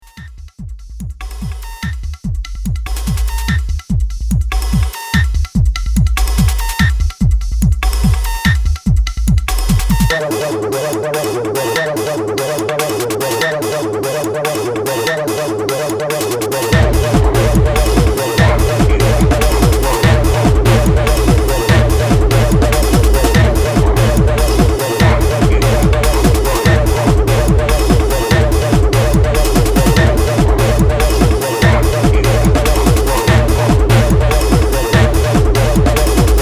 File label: Electro